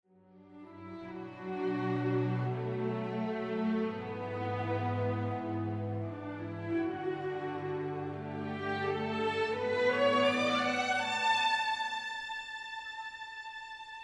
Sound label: Drama aspirational olden-days